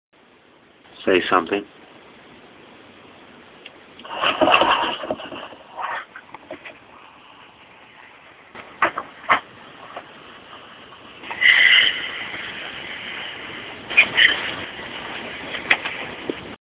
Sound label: Amplified EVP